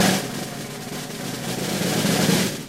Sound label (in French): caisse, batterie